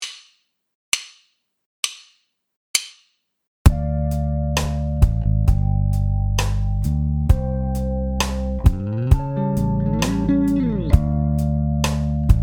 bass

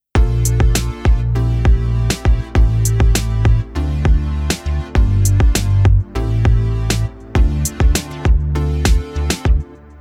Dry All

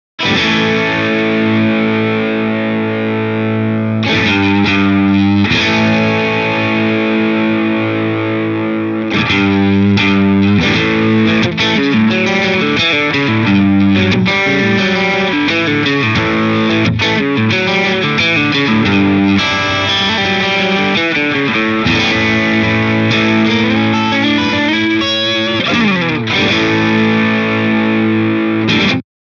gain wet; guitar

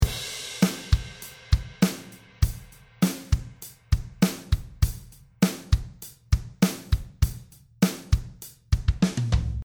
drummer preset; guitar

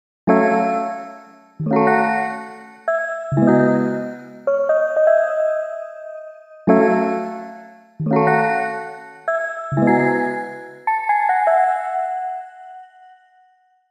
Bell

